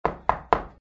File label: knock